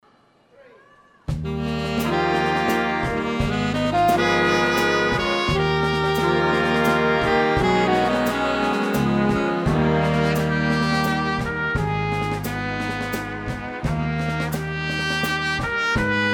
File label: waltz, ballroom; medlennyj vals blekpul; blackpool2015; prof ballroom